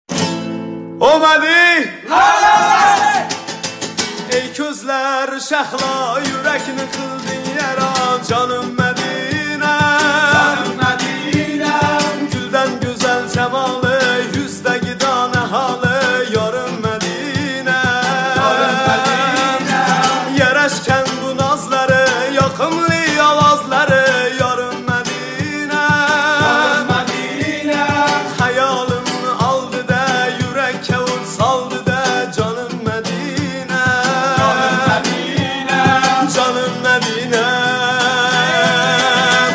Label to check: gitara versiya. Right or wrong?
Right